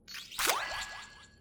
item get; HLD

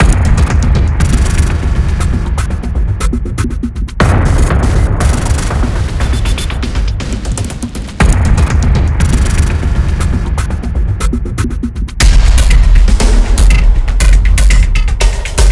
Test Song Allert; synthetik

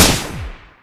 Cowboy Shoot; Risk of Rain

